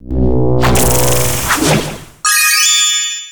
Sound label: Victory Screen; Nickelodeon Super Brawl Universe; Android